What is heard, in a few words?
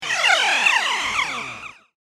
Space Rocket Taking Off; science fiction sound effect